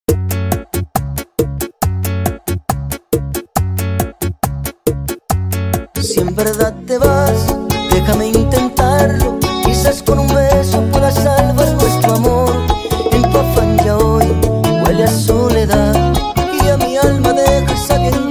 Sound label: Percussion Guitar, 138BPM